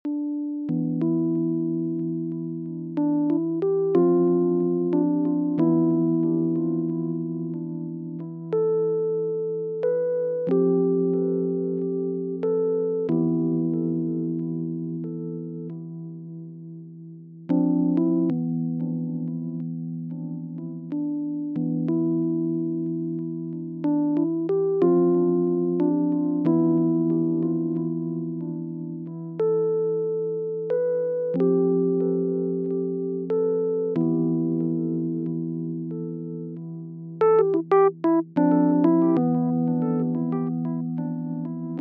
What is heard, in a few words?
rhodes